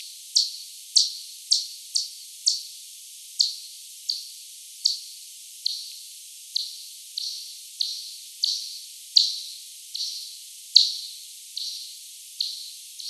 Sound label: Daubenton, 10x slow, bat